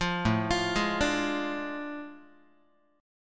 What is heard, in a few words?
A Major 7th Suspended 4th Sharp 5th, down, Guitar; AM7sus4#5; arpeggiated; JGuitar